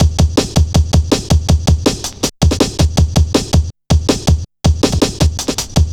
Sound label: Boy, Drum Loops, Jungle, 161.5; Zero-G Jungle Warfare 1, 2 & 3